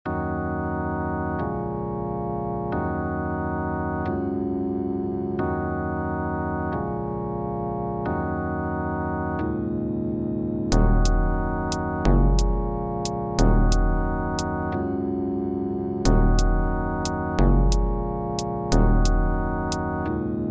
trap

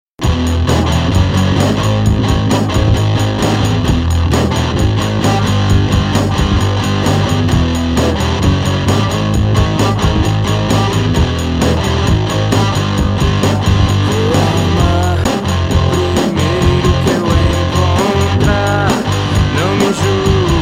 cellos

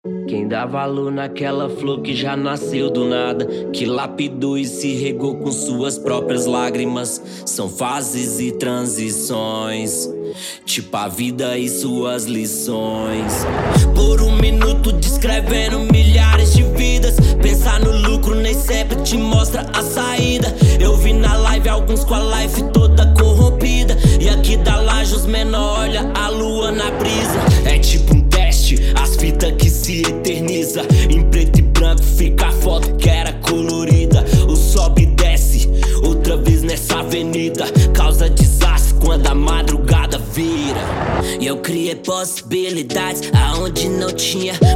rap trap

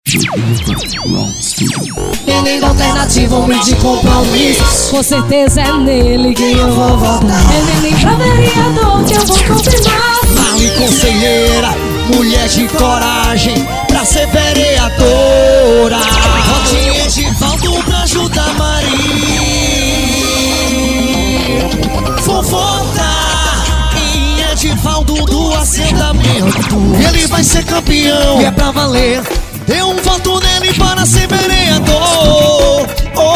jingles; JP HomeSTUDIO

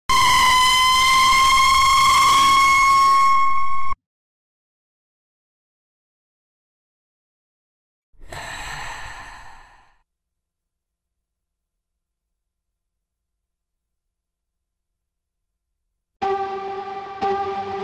bhoot sound scary sound horror effects

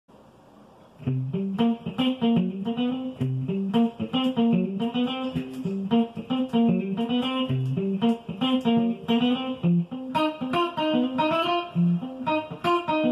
blues chicago blues; feel the groove; silver guy

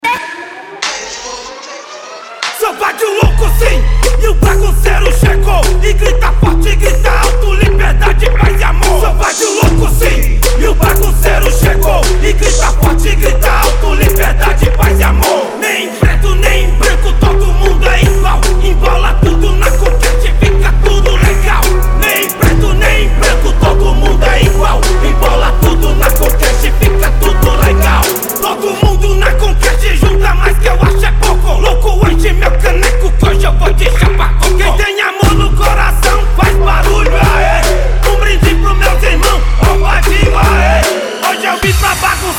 rap trap

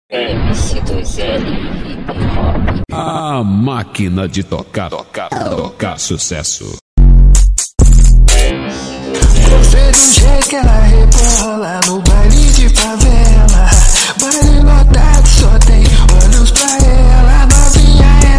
hip hop

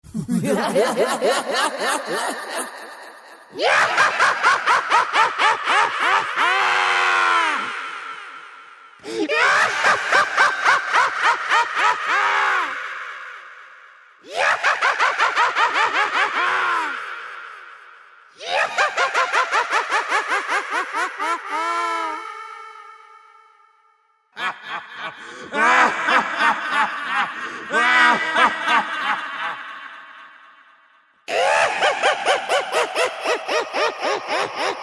scary horror clown laughing horror sound effect

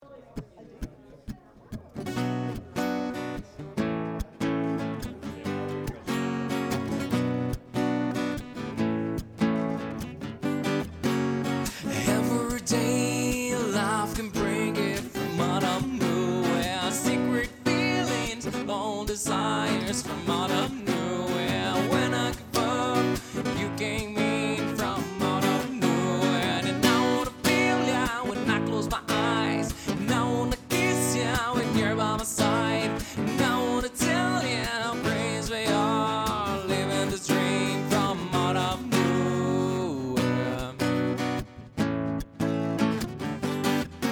acoustic

